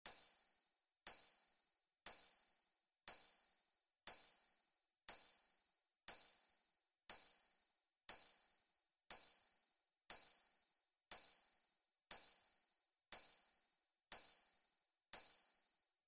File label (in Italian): Tamburello